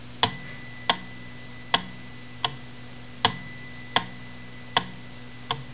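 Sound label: Wittner Wood Block, metronome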